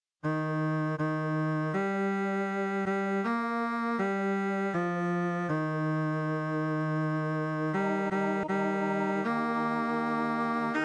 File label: tenor; Si ca vous chante; sons reels